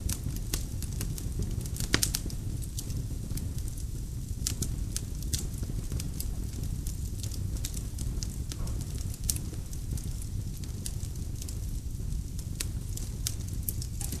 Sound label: Fire; HauntedBloodlines; HQ RetroFarmhouse; Particles